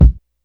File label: kick blaptivity